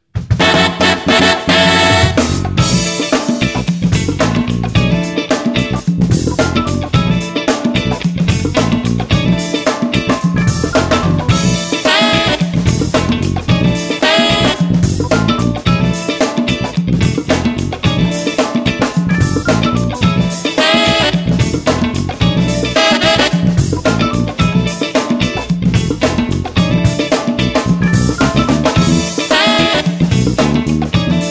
Power Train; music on hold samples

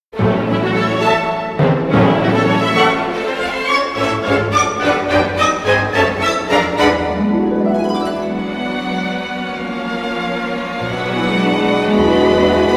Rainbow Waltz; music on hold samples

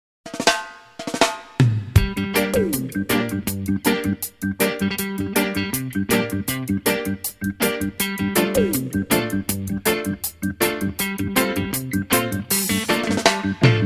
Roots Rocker

